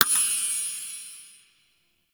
Boon Rollover, UI; Duviri

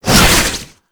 Whip Whoosh; melee; weapons; Oraxia

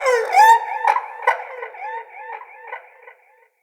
Ext Dist Animal; Midrath Island

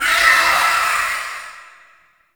Blade And Whip Heavy Vocal; melee; weapons; Warframe; Dagath Blade And Whip

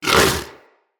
Vocal Tranquillized Grunt; Characters; Vulpaphyla

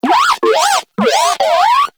Moa Compassionate Vocal Greeting Excited; Pets; Warframe

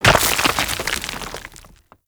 Arachnoid Camper Step Rock Debris; Warframe; Corpus

